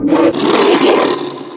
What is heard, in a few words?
frustrated growl, antlion guard; npc; Half Life 2; Episode 1